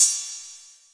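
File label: Roland CR-8000 cymbal; DRUM MACHINES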